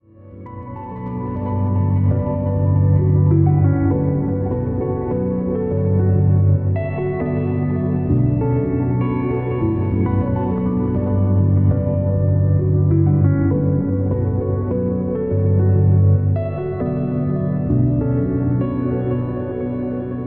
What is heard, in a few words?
Ambient Piano